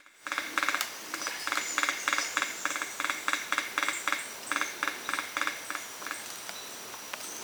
Carpinterito Ocráceo (Picumnus nebulosus)

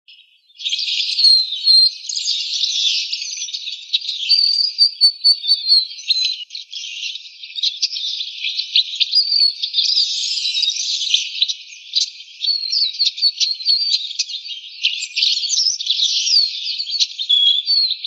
Varillero congo macho; Varillero Congo (Chrysomus ruficapillus)

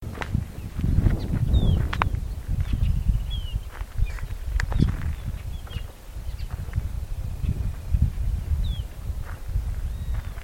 tordo

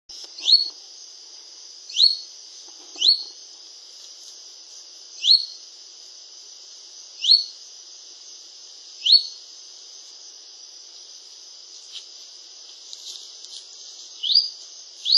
Picochato grande; Formosa

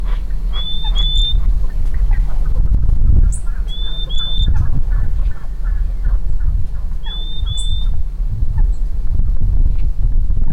tangara comun, Tangará Común (Euphonia chlorotica)

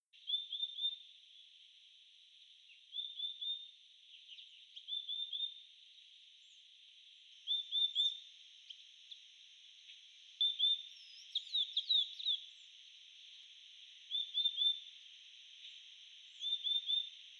Tangara Comun, Euphonia chlorotica; Jujuy

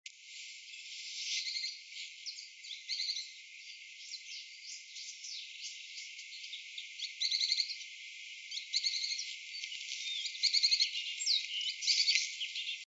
Pijui plomizo, Pijuí Plomizo (Synallaxis spixi)

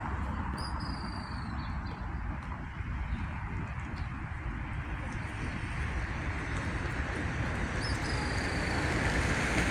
Voz, Piojito Trinador (Serpophaga griseicapilla)

